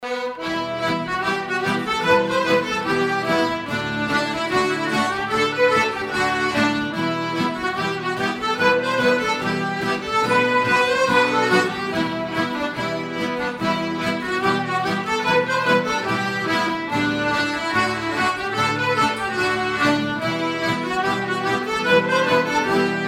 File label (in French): hornpipe